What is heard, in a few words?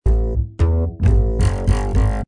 Bow Fidl, Rockabilly